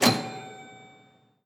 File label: bumper, pinball